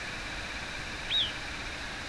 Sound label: Gray-cheeked Thrush; NY